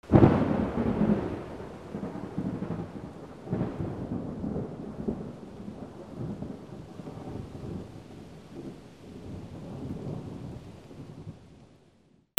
RAIN

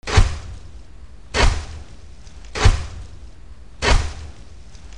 SCIFI

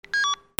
PHONES

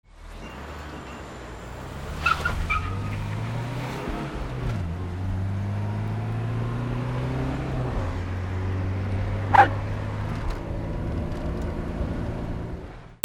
HIGHWAY

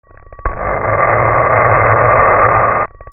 FRICTION

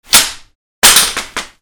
HOUSE

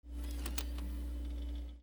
OFFICE

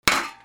FALLING

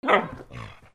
DOGS

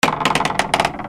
DOORS

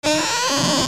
TOYS

